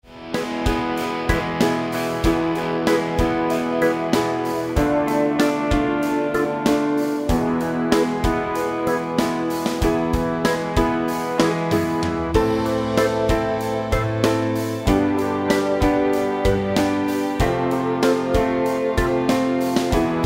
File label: Bass Spanish Journey